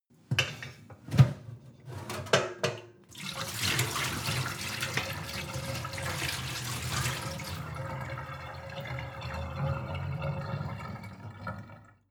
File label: sink water pot pour out